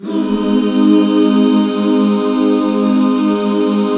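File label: Ab major, back chords; Sound & Vision - Gigapack II CD 1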